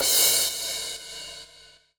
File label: Crash; Equipped Music; Loungin House